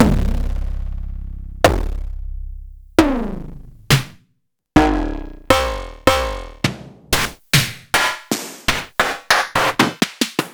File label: Snare c